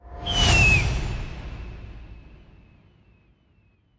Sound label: symbol crow; games; Demon Jack 27; Wazdan